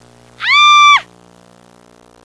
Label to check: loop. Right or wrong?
Wrong